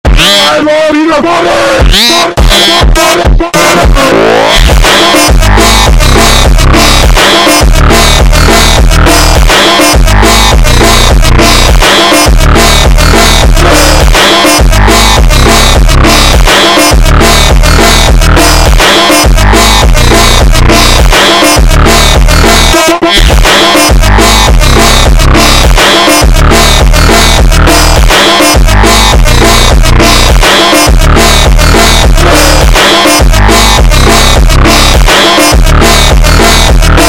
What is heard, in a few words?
super Slowed